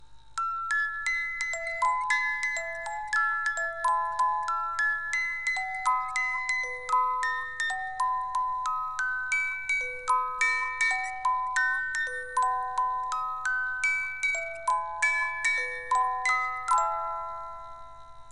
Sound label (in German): Blaue Donau, Musikwerk 18-Tunes